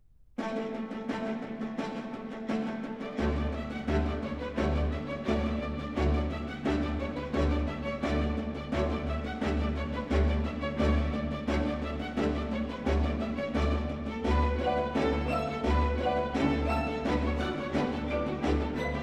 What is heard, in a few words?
ballet for strings & percussion, Bolero; Carmen; Orchestre Philharmonique de Monte-Carlo; after Bizet; Rodion Shchedrin